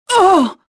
pain

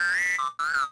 vox, droids robots

